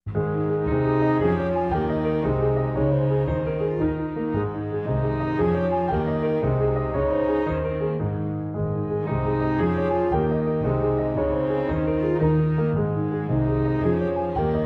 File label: Jig With Strings